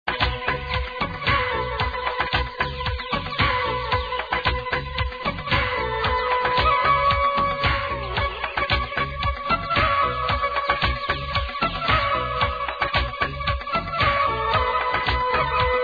Cool Musical

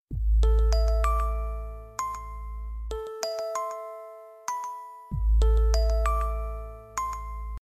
Soft Beat Sms

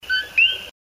Coqui single

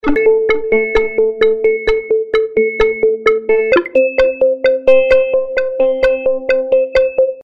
Notification sound

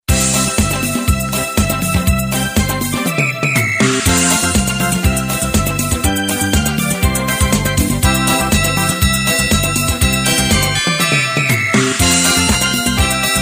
Jingle bells remix

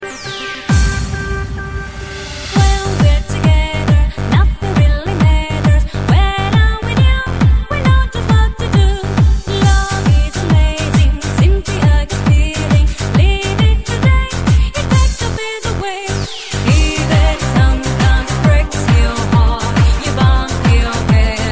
Bass

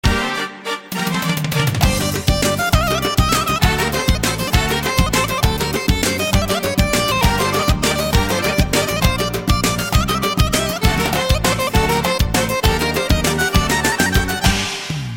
Kavkaz Armenian

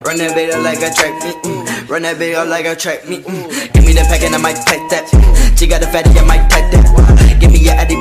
Trap; Sesame Street

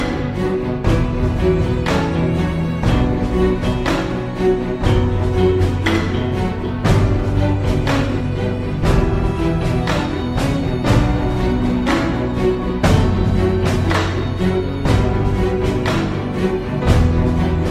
Dramatic

